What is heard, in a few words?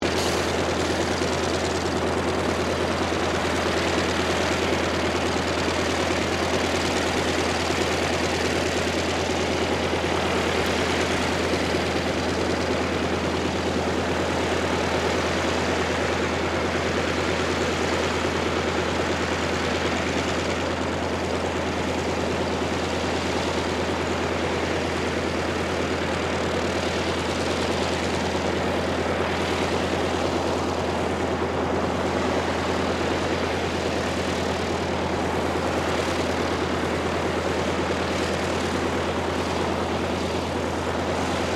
AIRCRAFT CAC WIRRAWAY IDLE